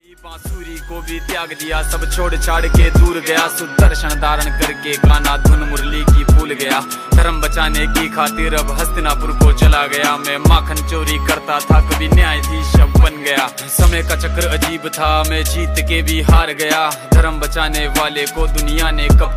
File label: Ringtone